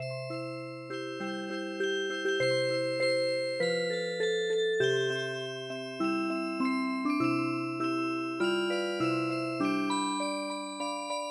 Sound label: Music Box, traditional